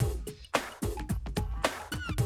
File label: drum loop, 100 BPM